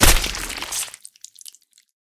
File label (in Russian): chetkij smertelnij vistrel s razletevshimisya kishkami